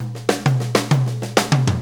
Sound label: DRUM TOOLS